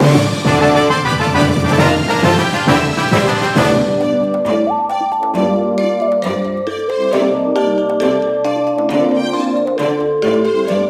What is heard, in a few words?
Leave Port Fanfare; Steel Diver